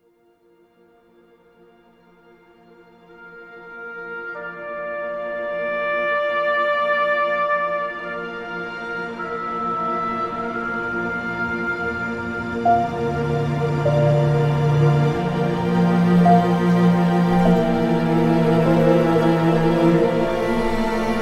Score Orchestral Piano

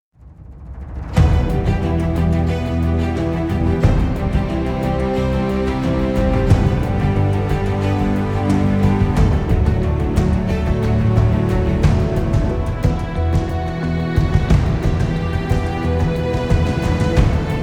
Score Orchestra; Bermudadreieck Nordsee

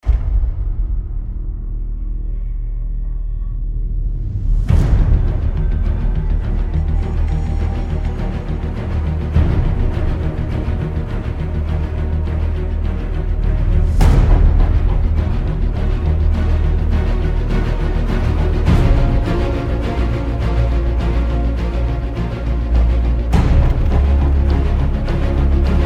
Dark Fantasy; Native Instruments

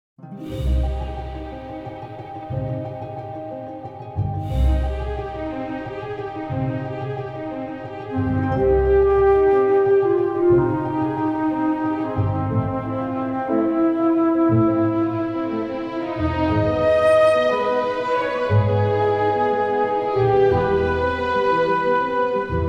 Orchestral Mysterious

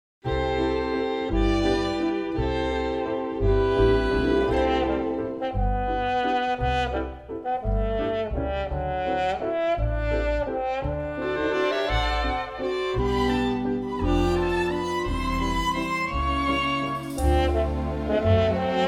Waltz Menutheme Bigband

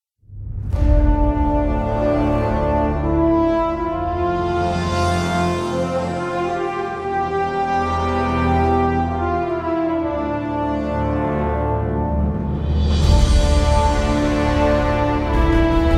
Theme Orchestral; Anno 2205

